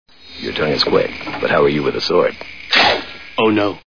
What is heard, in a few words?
sword